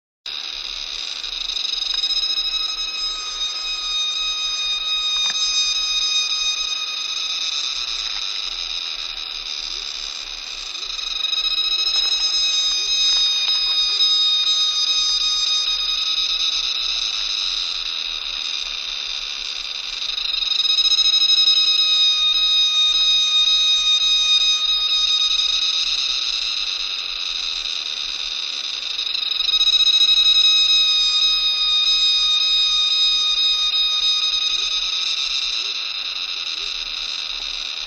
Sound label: Sawing Insects